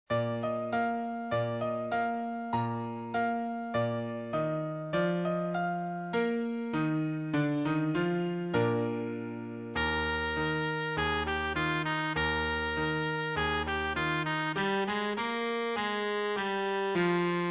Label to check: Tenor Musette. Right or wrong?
Right